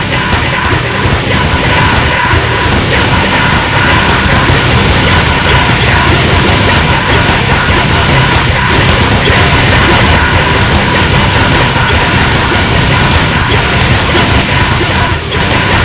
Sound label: annoying tune